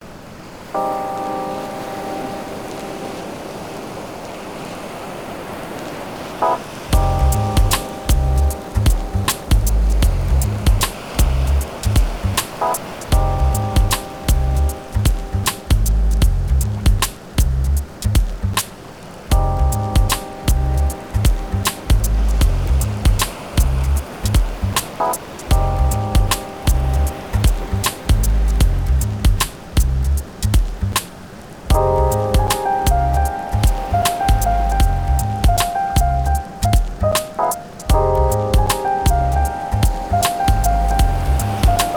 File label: Gentle Sound Of Rain